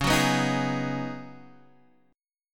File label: C sharp 7th Suspended 2nd Suspended 4th C sharp down Guitar Half Step Down; C#7sus2sus4